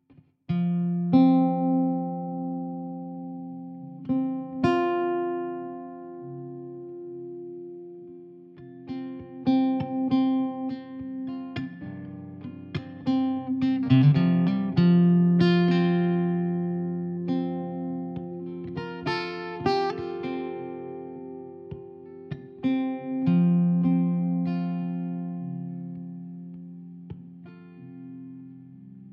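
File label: F 100 guitar cliche groove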